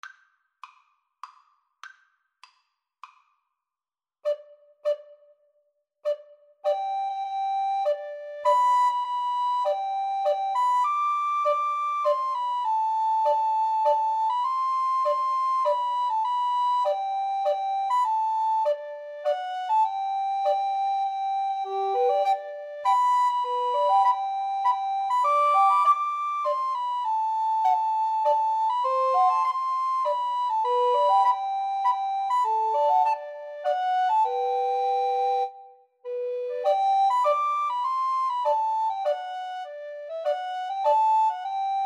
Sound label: clementine, Recorder Trio